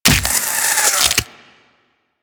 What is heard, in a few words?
Embed Burrow; Uzi; Warframe; Infested